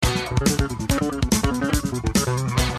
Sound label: Bass